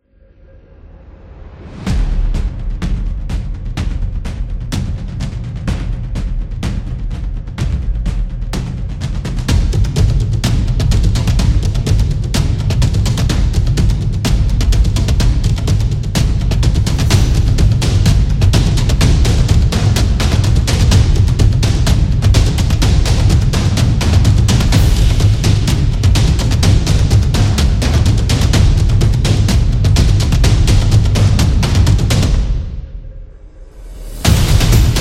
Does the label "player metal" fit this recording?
No